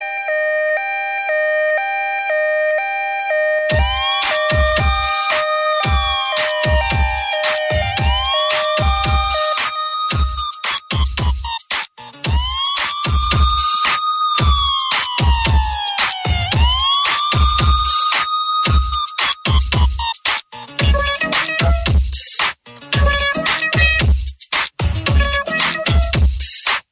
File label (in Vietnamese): Tieng coi xe cap cuu remix